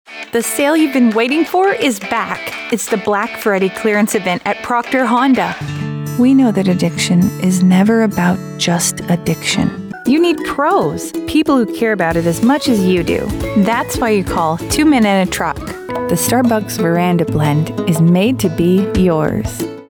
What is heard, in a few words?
Music